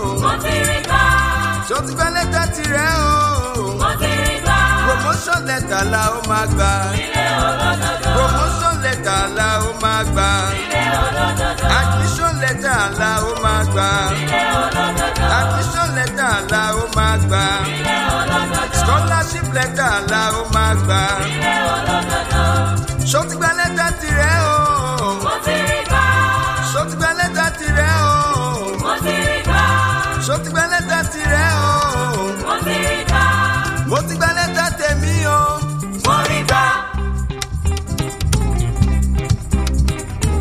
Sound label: ARIWO AYO Yoruba Medley